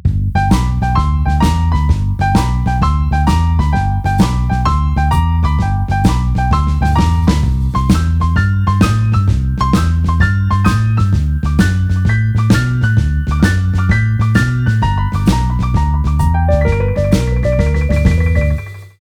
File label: blues, ringtones; OnePlus 7T